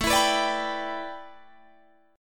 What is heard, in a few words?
A 7th Suspended 2nd Suspended 4th Guitar Drop D; A7sus2sus4 strummed